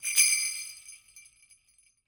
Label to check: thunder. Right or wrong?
Wrong